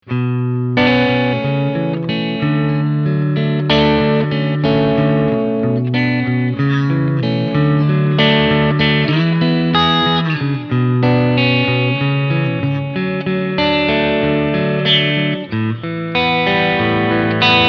harley benton te 90qm hh trans blue

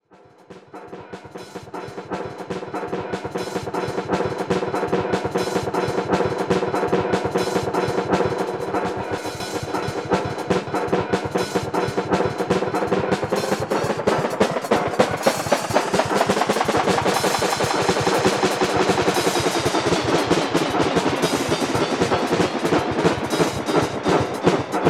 ROLAND M480 RE 201 Space Delay

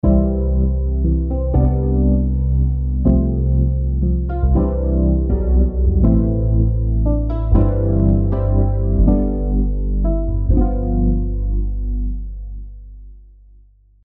Emotional Piano Rotary CLS 222 Bass Stereo Leslie; Pigments

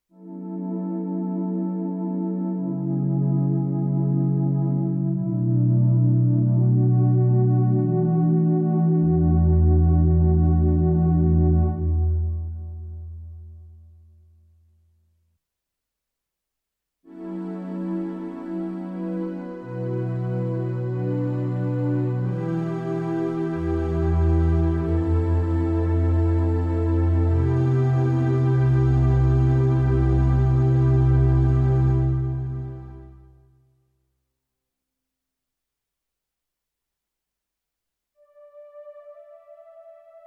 Roland BK3 Pads

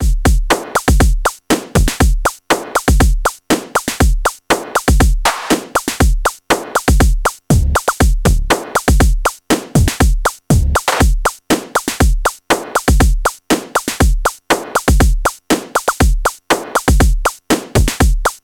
Transient Plus Sample Select Beat; Future Retro

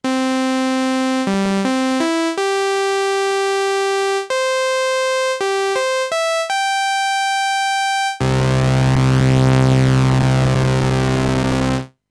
Basic Patch

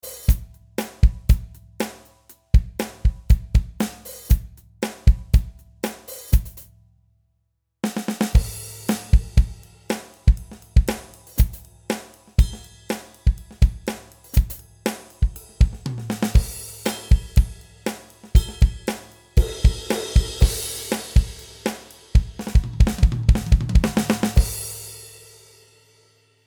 Oak Custom

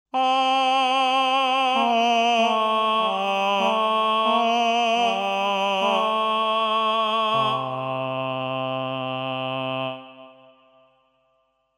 Reason 10 Humana Male Tenor ah

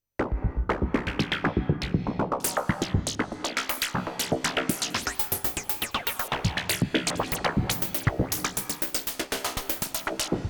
DM12D Rhytmic Pattern

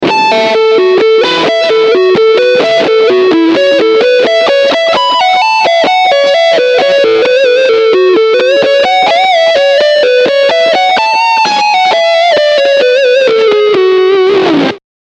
AC-30 Leadchannel

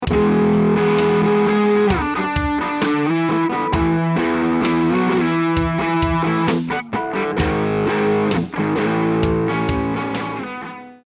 Packrat Caroline; JHS Pedals

